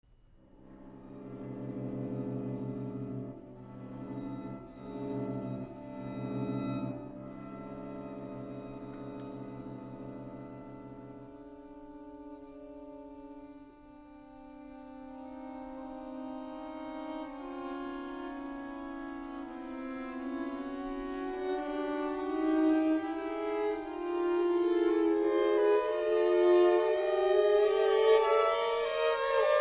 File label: String Quartet